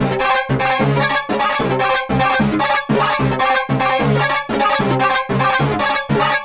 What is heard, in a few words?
horns, 150; ZZT HORNS; Masterbits - Tekkno Trax; SQ LOOP 150